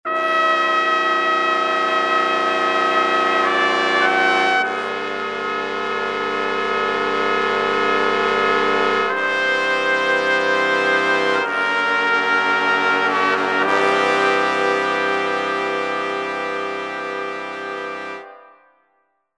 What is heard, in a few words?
Trumpet Song